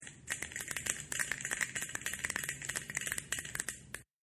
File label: snapping